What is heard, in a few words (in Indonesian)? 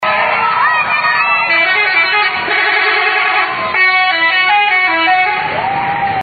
nada dering klakson telolet